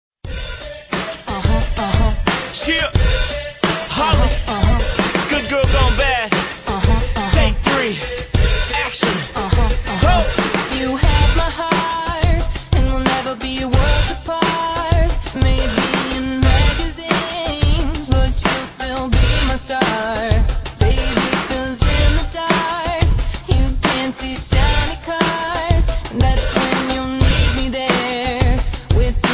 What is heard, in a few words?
singing in the rain